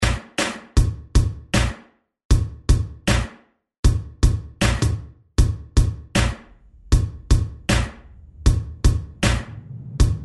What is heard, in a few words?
Rock ReDrum 78 Bpm; DJDOLLS